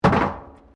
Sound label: METAL BIN IMPACT PLASTIC CRASH BARRIER